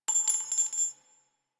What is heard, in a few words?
Sniper Shell Casing; DE; Warframe; Frumentarius; Exalted Sniper